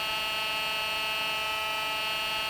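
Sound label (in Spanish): SILLA MOVIMIENTO LOOP, chair movement loop; SILLA GINES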